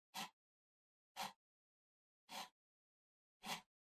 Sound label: PC-Mouse-Move (Far-Mid)